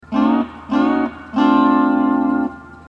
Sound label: hawaiian piece